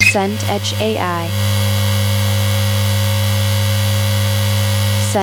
bench grinder loop

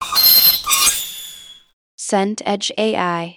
brake squeal

